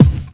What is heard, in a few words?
club kick drum one shot d sharp key, steel kick drum tuned to the D# note